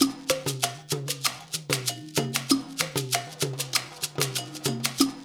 percussion loop; InVision Interactive; Percussion Slam

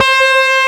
C4, GUITAR; AKAI S-Series CD-ROM Sound Library VOL-8

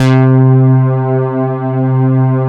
OB LOW P

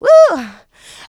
Vocals, Adlibs; Houseworx

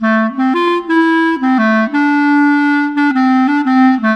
Clarinet; Sonic Foundry (Sony Creative Software); Orchestral Series VOL-4; Rock and Pop; Rock-Pop 02

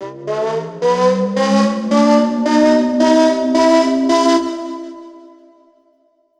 Verb Proc, alarm siren, 110bpm; musicradar

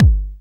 BD, Dance Mega Drums, HIP

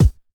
BD; Best Service; Dance Mega Drums

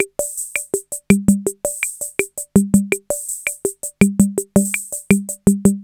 66 BEGUINE, DrumBase5000, TR-66; Crypton - DrumBase5000

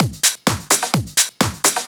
Clubhouse Loop 128 BPM

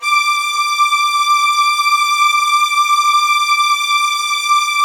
D6 SUS, LOUD VIOLINS; Q Up Arts; Denny Jaeger Master Violin Library

